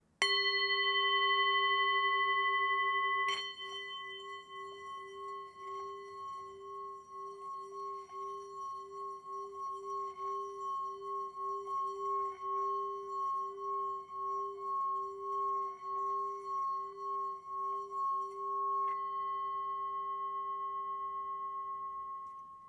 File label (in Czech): tibetska misa